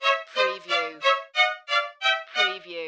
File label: Music Strings Ascend